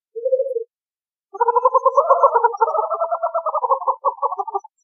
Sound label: pac screech